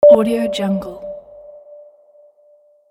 notification blip

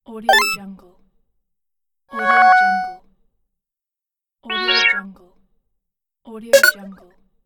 complete app notifiers

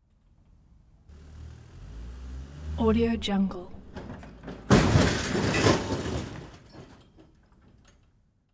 vehicle drop

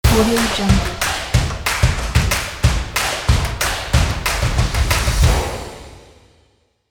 energetic claps and stomps logo

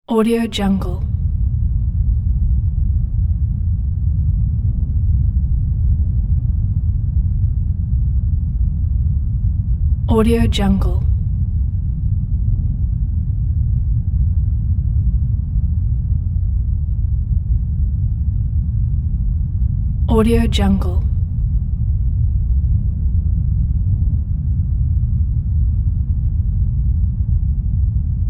deep low rumble; sound ideas

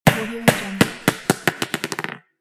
bouncing ball

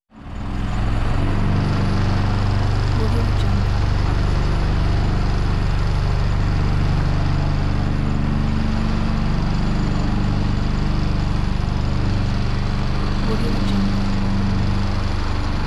industrial noise; sounddivision

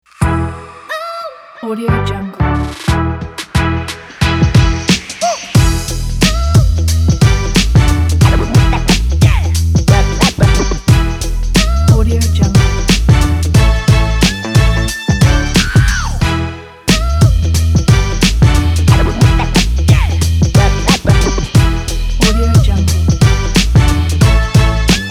boom bap positive; alexshulgin

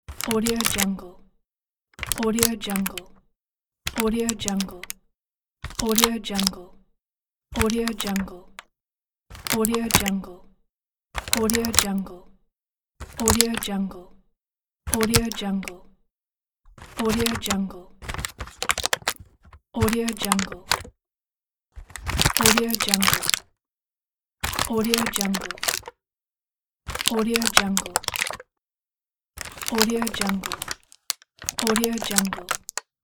take the candy out of the package

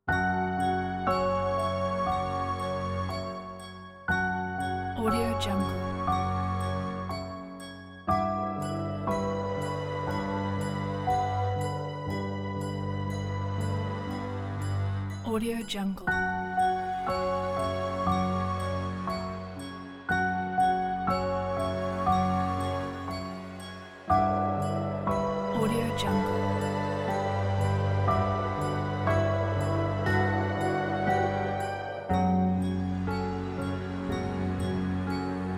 troubling threat; purpleplanet

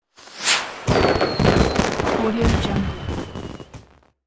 firework

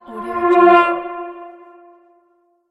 incoming horn transition

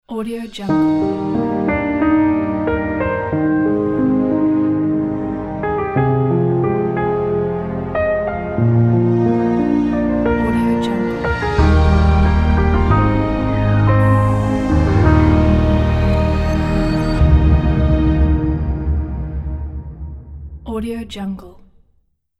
logo elegant piano; alexgmusic

